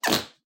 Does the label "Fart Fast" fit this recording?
Yes